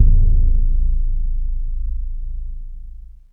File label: Concert BD; Roland M-OC1